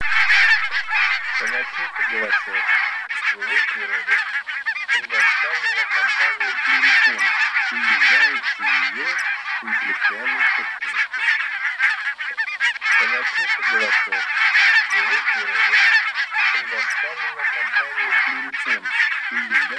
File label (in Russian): Казарка белощекая Oca Faccia Bianca; plurifon